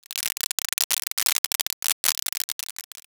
applause

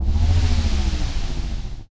dragon roar

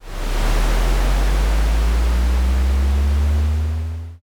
rocket launch

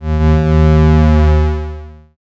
creak

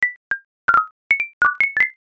raindrop

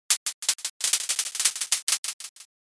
sparse clap

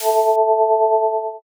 shield up

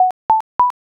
loading

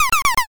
witch laugh

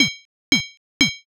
blacksmith

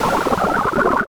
drown

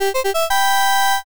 brass

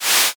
whiff

